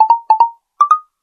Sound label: plunky xylo fakes; Loops; Electronic